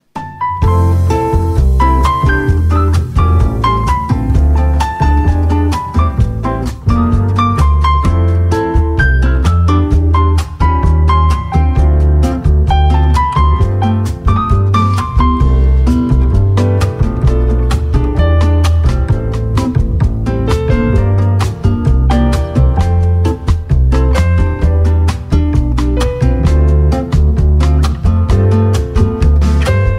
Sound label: Jazz